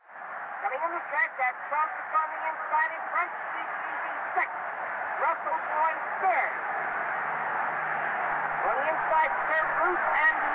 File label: Radio Sound